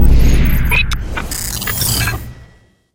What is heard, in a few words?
spawn